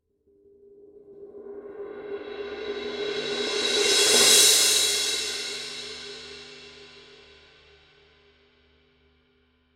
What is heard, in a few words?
18 thin crash swell